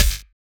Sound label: Percussive